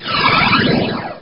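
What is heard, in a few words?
teleport, sfx; dn3d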